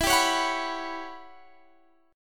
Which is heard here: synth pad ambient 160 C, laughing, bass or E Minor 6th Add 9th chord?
E Minor 6th Add 9th chord